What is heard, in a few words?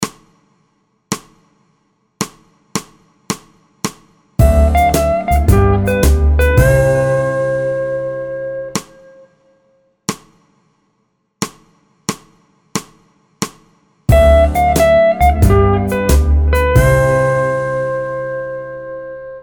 Key C Vamp 4 4